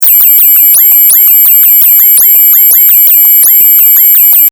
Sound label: fm synths